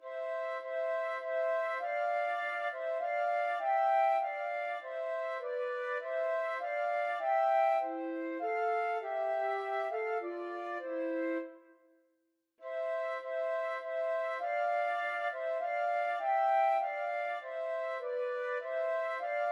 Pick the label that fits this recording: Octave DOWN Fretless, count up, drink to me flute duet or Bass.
drink to me flute duet